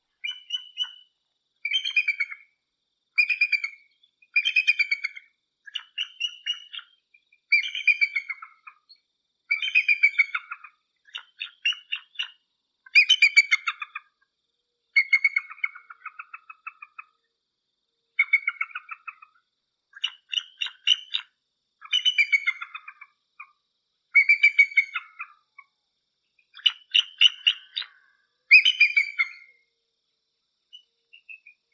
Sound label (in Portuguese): Canto da Aguia americana